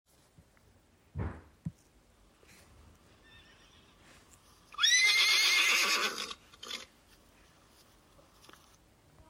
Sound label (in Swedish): Gnäggande häst